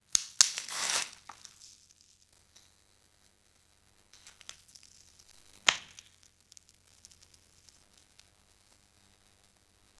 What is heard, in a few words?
apple is cut in half